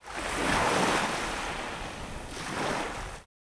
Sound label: wave, ocean; ambient; Cubed